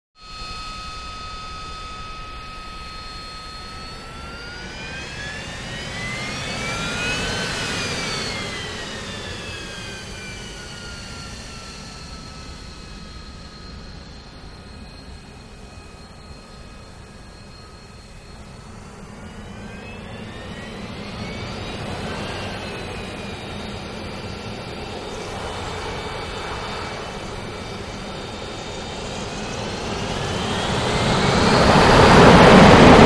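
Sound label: Harrier Takeoff Vertical, av-8b harrier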